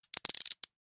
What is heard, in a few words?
Handcuffs Close Latch Clicks; Emergency; Objects